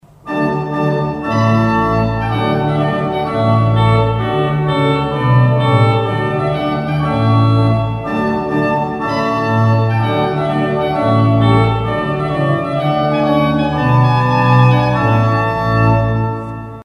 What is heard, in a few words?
Purcell trumpet tune